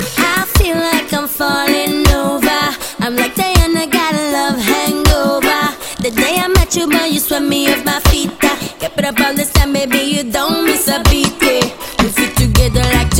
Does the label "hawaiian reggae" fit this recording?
Yes